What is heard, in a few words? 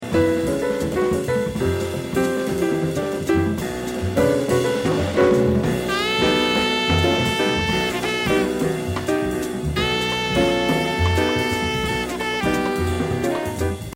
juju rhythm section energy